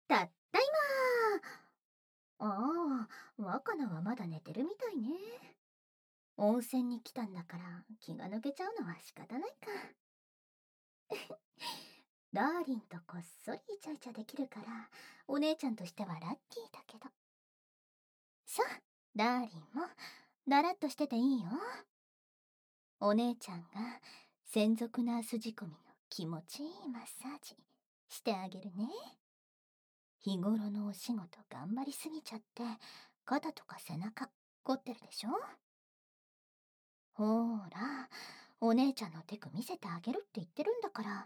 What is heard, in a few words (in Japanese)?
マッサージ, SEなし; 新妻わかばとお風呂の後にマッサージ